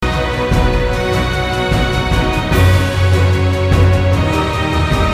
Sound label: Game Tune